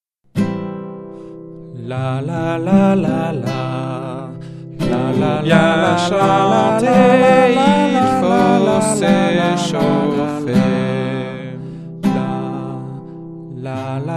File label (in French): echauffement voix